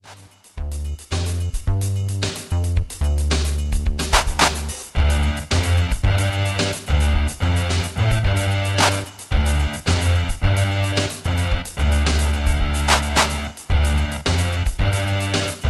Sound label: rock the marimba